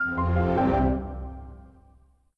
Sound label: Windows Blackcomb Shutdown; Windows Never Released Soundpack 2